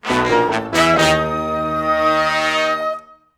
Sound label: Duel event; Duel challenge score has been updated.